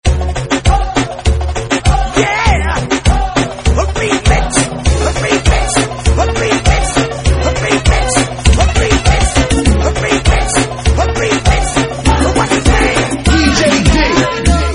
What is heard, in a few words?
Reggaeton Mega Mix